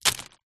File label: food sweet wrappers handful drop throw down wood